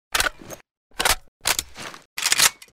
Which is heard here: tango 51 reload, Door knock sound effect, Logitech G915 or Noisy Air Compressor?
tango 51 reload